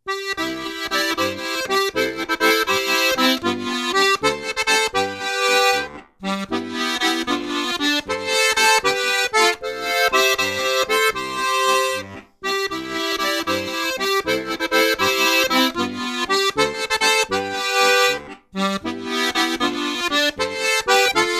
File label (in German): Tremolo sehr flach, steirische harmonika